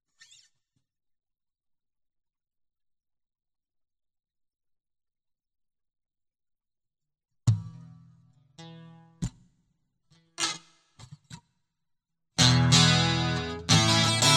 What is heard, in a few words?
Extra Guitar Loop